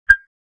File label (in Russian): Евростук; 2сек; 22050; Cd Comsignal Ltd